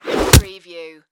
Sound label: Arrow Impact Human